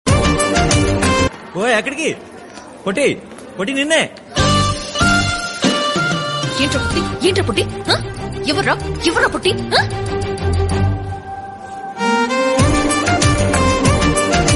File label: BGM MUSIC INSTRUMENTAL; TELUGU